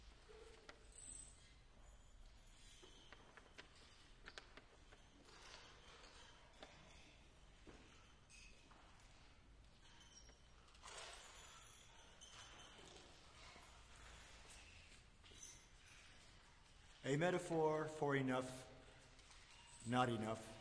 Boys Choir; Enough! Not Enough; Iowa City

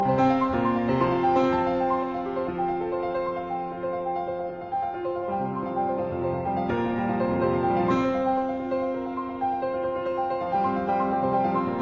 Preludes and Fugues Prelude in F major; No 5

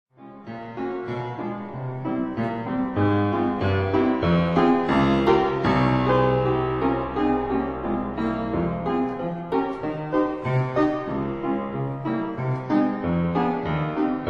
Keyboard Sonata in B flat major, Largo sostenuto

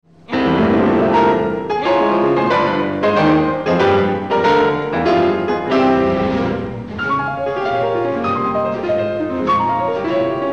Piano Concerto No. 2 I. Allegro non troppo; Brahms

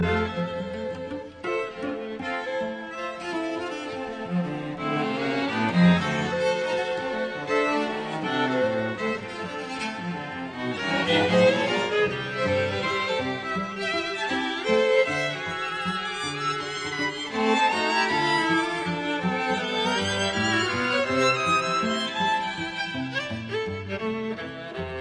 String Quartet; Chihara

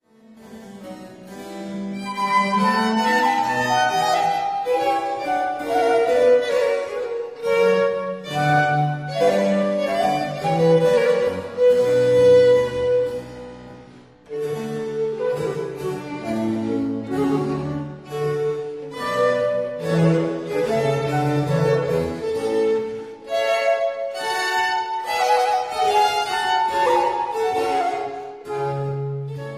for 2 violins & continuo; L'Espagnole (La visionnaire); Les Nations; Seconde Courante

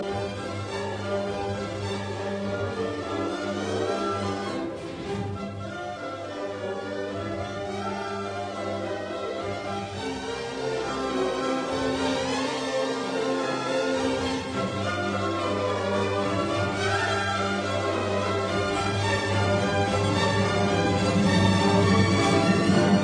Symphony No 9 in C major Allegro vivace; Schubert

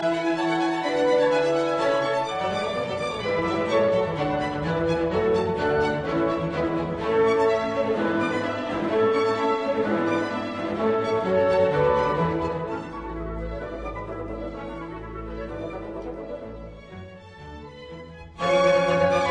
Piano Concerto in A major, Allegro assai; Piano Concerto No 23

